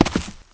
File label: recoil; people; Pirates Of The Caribbean